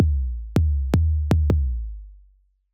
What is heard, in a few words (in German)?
808 nach kompressor und high pass bei 50 hz